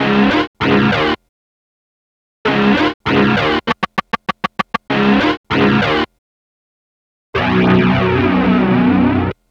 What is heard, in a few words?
Power Guitar; Sonic Foundry (Sony Creative Software); Club Joints and Street Anthems; Session 11 - Rock Star